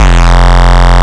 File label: Root F, Bass